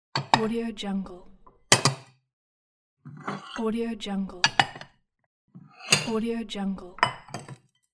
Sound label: glass lid